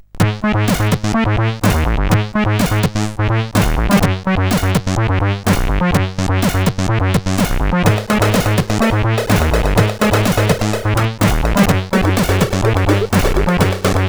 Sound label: tune, sid CSG8580R5; Scrap Iron tune 3